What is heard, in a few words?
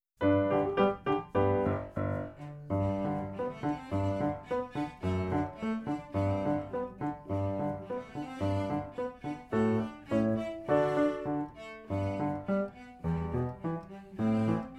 Piano, cello; Suzuki Cello Vol 2; Hunters' Chorus